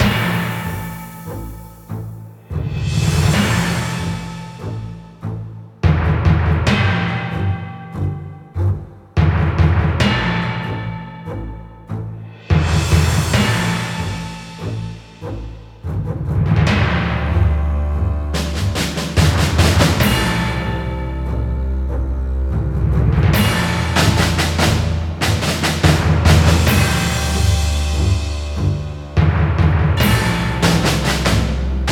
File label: TENSION LOOPABLE